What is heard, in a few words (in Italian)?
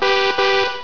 clacson; rekenles